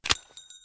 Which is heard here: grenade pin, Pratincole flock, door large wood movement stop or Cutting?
grenade pin